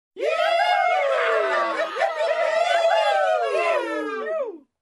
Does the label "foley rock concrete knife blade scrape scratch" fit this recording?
No